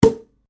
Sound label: CORK CHAMPAGNE POP, Champagne cork popping